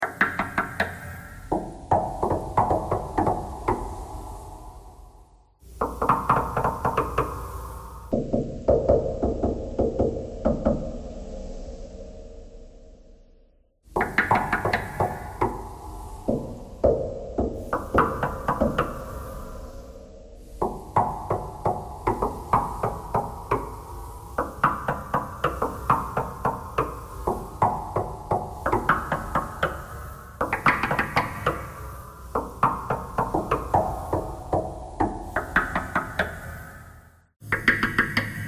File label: knocking thread noise